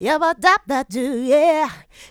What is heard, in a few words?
Vocals, Adlibs; Houseworx